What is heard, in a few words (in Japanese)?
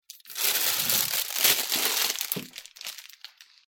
食材を漁る音